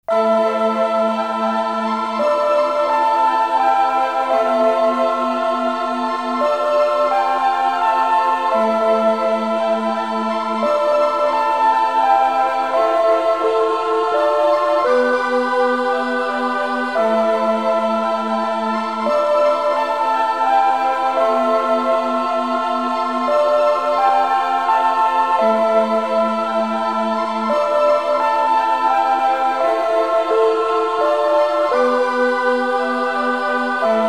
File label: Siren; Shin Megami Tensei II